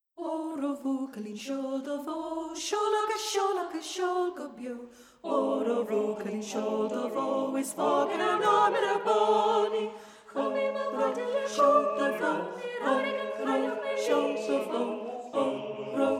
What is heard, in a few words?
Oro Bhuachaillin seol do Bho Alto